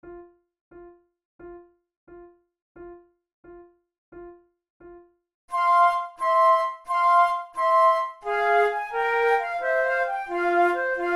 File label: flute sonata gigue; mattheson